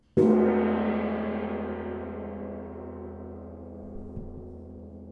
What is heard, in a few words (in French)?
regime transitoire, GONG VIBRATION